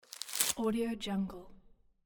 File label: pull tissue from tissue packet; audio-therapy